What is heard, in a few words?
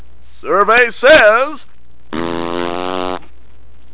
honk